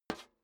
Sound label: step high heels metal dry slide short; schritte